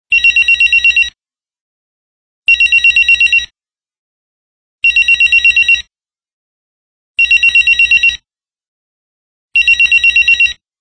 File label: ringer; ringtones